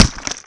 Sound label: Gib Sound